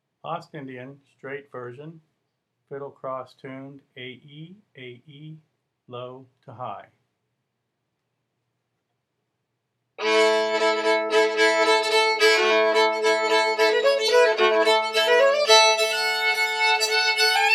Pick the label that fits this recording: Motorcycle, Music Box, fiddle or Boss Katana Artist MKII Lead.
fiddle